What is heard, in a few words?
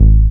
bass attack, techno trance